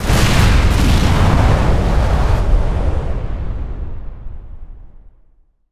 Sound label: boom